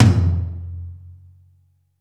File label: ROOM T, ROOM KITS; Crypton - DrumBase5000